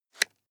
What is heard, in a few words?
nylon leather clip